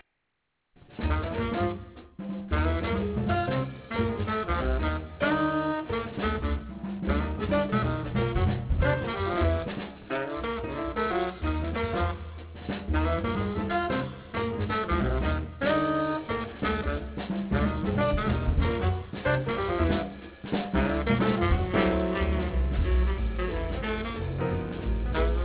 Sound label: piano